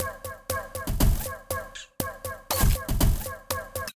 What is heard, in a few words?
Kit, drum racks; Predication Plasma; Ableton; Factory Packs; Mood Reel